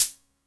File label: HH, Video-Tech Rythmic10